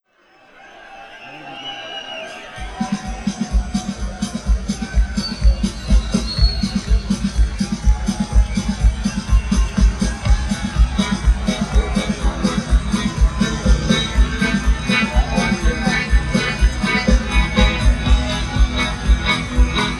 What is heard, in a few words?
guitar and drums jam